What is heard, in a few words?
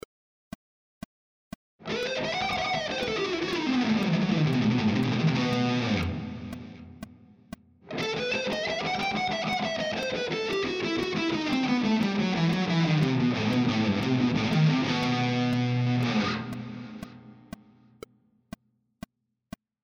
phrygian dominant